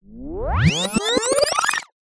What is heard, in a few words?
musical music rewind